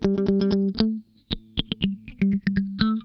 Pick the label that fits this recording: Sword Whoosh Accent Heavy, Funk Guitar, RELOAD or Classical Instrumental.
Funk Guitar